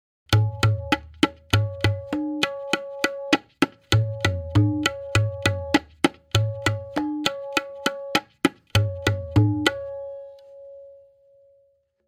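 Main Bol 2x-Played, Benares Kaida 1 at double speed, Tintal; Benares Kayda 1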